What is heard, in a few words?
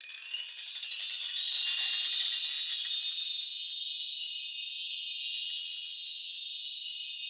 CHIMES; Xsample VOL-9